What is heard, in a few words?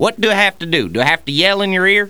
EAR YELL; 8BIT44K